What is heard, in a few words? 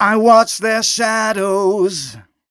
m 127 mel a, Vocals